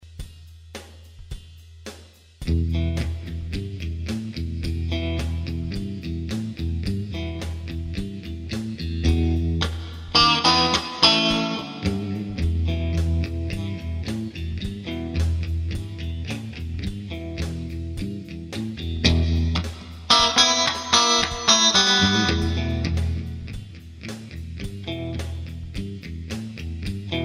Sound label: bridge, strat